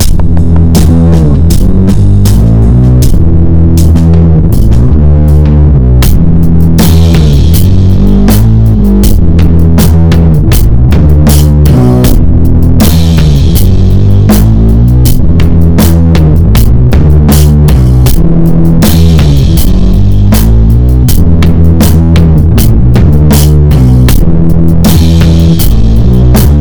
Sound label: Bass